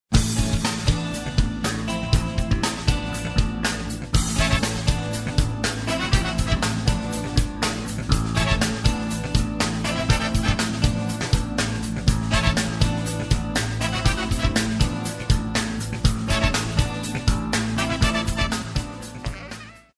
pop rock

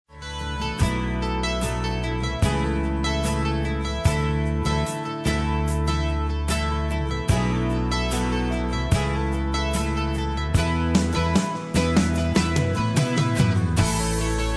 pop rock

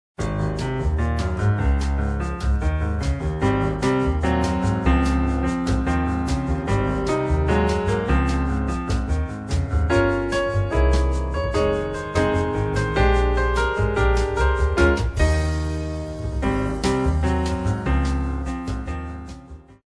Jazz

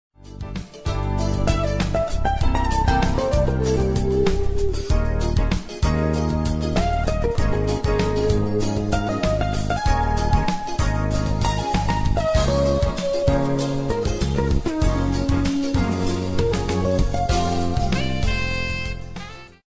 jazz smooth

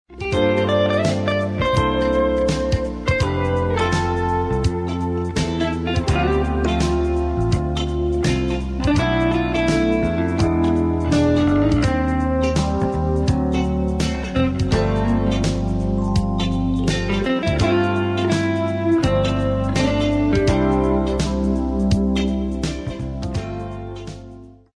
jazz smooth

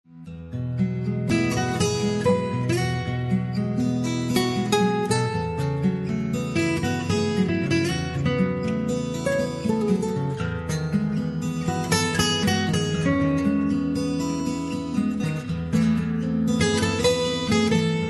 acoustic guitar

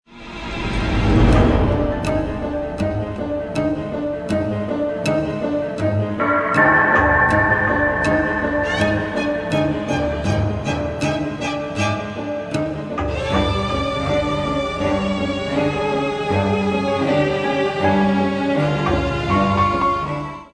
halloween

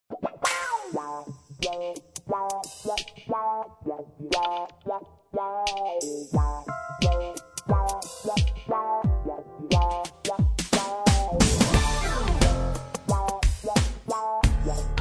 rock funk

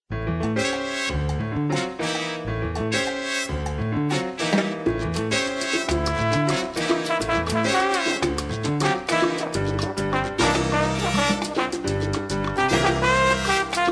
tropical

